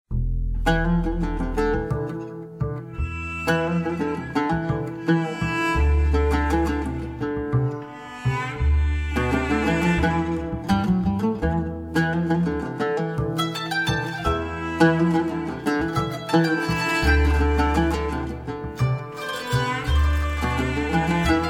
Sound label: Singing